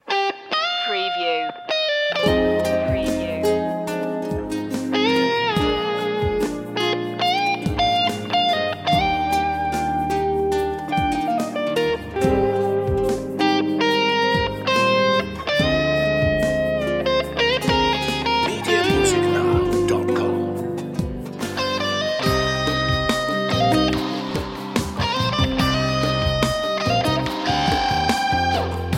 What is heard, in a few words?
mediterranean guitar